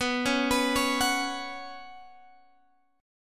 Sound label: B Suspended 2nd, Guitar, Open G